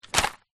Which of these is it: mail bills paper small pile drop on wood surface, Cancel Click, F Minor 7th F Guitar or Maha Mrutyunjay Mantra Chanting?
mail bills paper small pile drop on wood surface